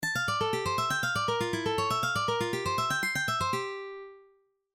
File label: D Minor Arpegio; Coenen Marcel